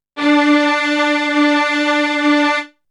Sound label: Strings; ZERO G - Datafile DISC 3